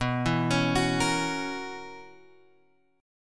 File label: B Major 7th Suspended 2nd Suspended 4th B, chord shape; BM7sus2sus4 Chord {7 7 X 6 7 6} arpeggiated